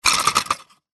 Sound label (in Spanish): juguetes juguete